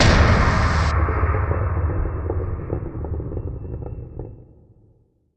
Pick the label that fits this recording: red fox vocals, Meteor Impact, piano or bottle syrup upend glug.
Meteor Impact